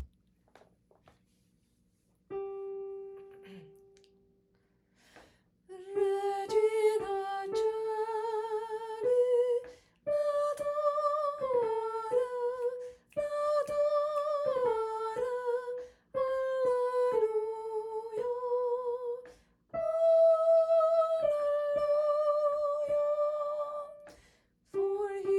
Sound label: Soprano; Regina Caeli